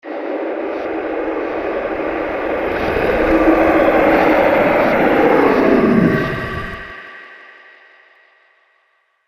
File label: Big Foot In A Blizzard; Monster Sound Effects; fantasy sound effect